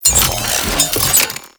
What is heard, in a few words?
Turret Deploy; Warframe; Corpus; Corpus Railjack; Crp Admiral Agent